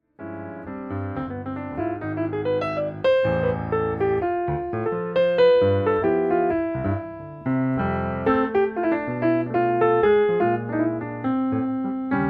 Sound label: piano